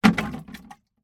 household watering can plastic full place down hard surface; sound effects one